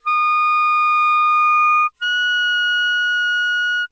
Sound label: clarinet; Spectrum Interpolation Synthesis for the Compression of Musical Signals